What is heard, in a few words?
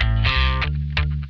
FULL OCT, Funk Guitar; Zero G - Funk Guitar